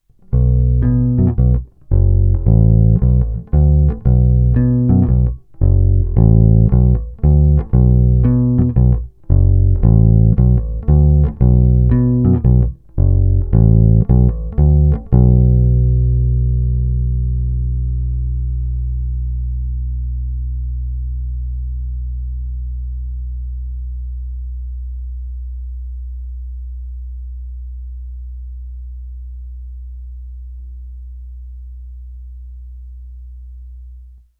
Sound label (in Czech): Precision Bass, Hra mezi krkem a snímačem; plně otevřenou tónovou clonou; Fender Custom Shop Pino Palladino Signature Precision Bass; vyvedeny rovnou do zvukovky